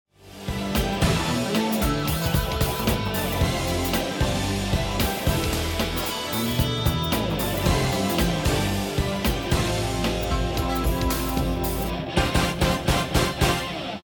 choose team; music kits custom

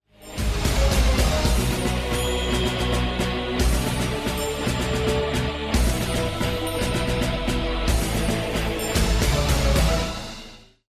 bomb ten sec count; music kits custom

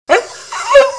death

